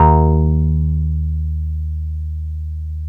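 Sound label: OB8 piano EB1, OBERHEIM OB8; Big Fish Audio - First Call Vintage Keyboards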